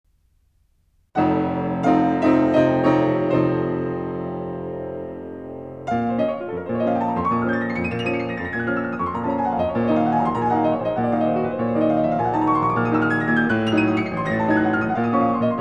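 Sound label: Preludes, In B Flat Minor, piano; OP. 28 No. 16; Chopin; Claudio Arrau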